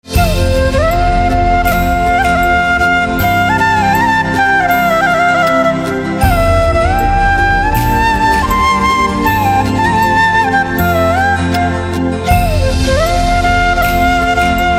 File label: Flute; traditonal devotional